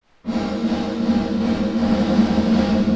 REVERBERATION ECHO Level 0.0dB Time 800ms Rolloff 500Hz; Cinematic rooms